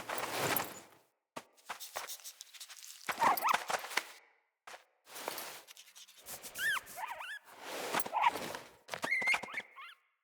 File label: Hare; Encounters; Animal Rescue